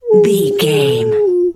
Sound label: wolf howl soft